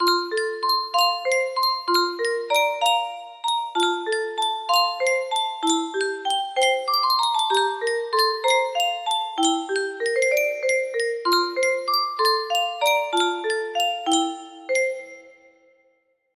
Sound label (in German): yunsheng spieluhr